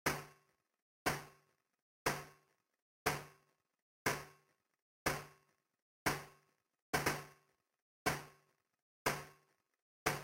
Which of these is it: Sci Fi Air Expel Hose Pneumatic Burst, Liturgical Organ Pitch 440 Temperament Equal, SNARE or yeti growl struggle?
SNARE